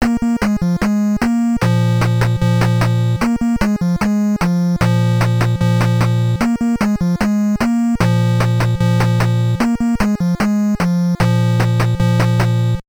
Frontier Jingle; North & South; NES

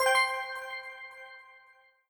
points ticker bonus score reward single; Tales of the Tiny Planet

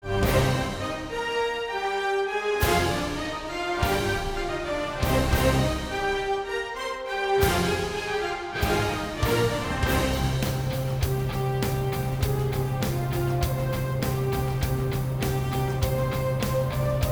Action Urgent; rwby grimm eclipse

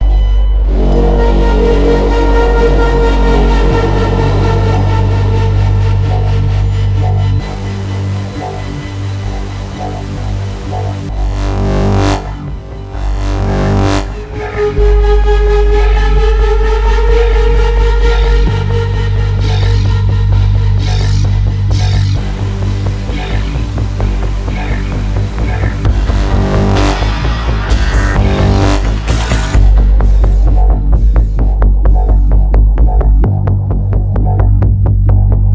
Propulsion Exhaust (Suspense)

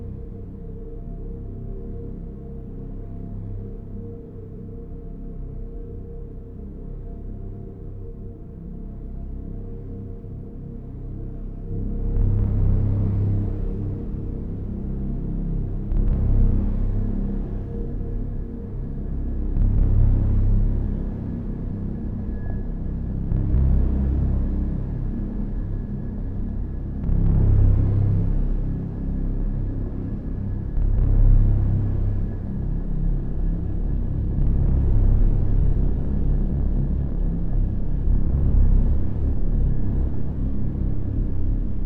Music Drone; Battlefield 3

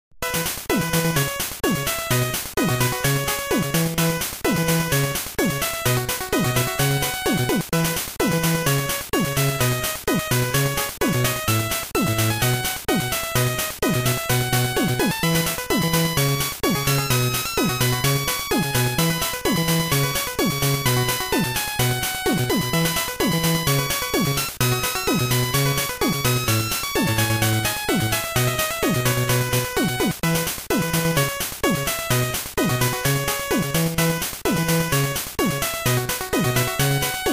Bustling City

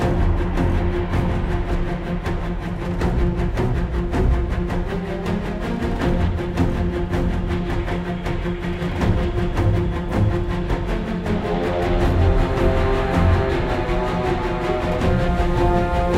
Combat High Threat Loop

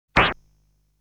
Boss damage sound

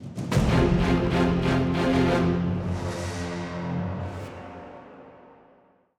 190bpm; Legends of Pegasus; windows gamerip 2012

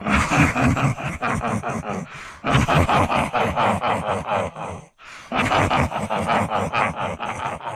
Enemy Boss Laugh Loop; Enemy Boss Pastor Cruzes

